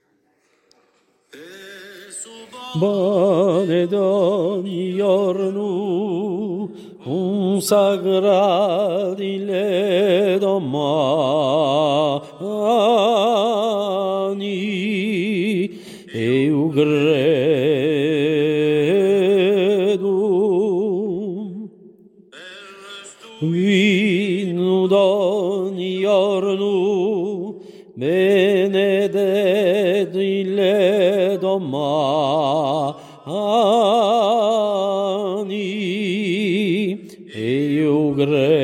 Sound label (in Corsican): Per stu pane BASSES; Santa Reparata di Balagna